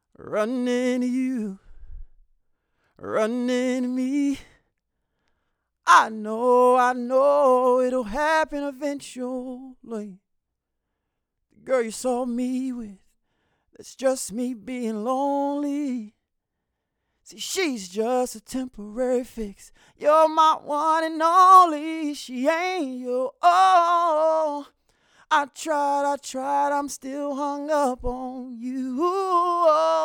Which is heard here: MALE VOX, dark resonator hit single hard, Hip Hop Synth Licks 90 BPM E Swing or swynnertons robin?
MALE VOX